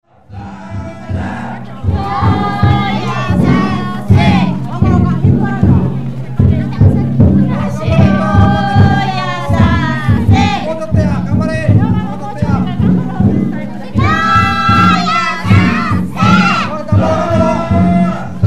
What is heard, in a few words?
taiko